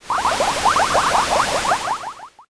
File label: boiling